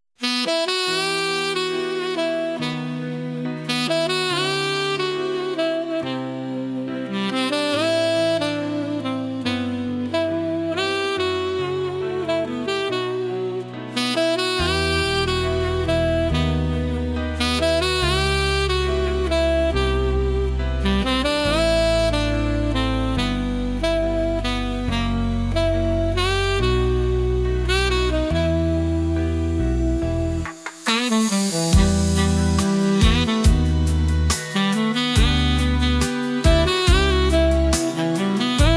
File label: sax serenity